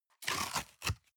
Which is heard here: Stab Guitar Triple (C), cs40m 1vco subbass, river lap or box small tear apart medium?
box small tear apart medium